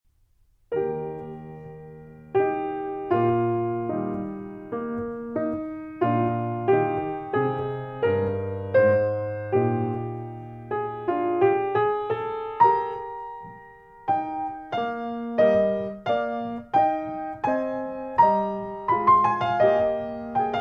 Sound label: Adagio From Piano Sonata K570; Mozart